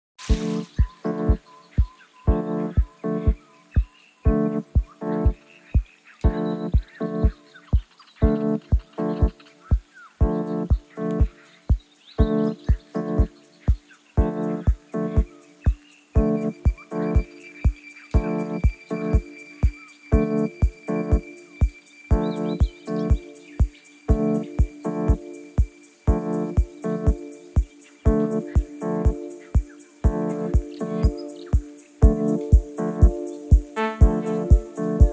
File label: afro house; afro under ground